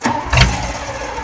ignition; CRC2005; CRC 2005 Demo

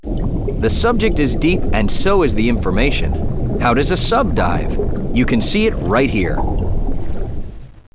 ocean

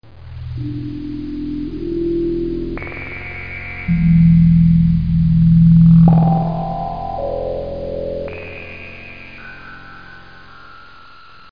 tube beep; Weird - The Truth Is Stranger Than Fiction

